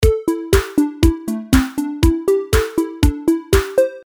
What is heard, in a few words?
delay